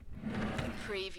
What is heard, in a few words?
desk drawer open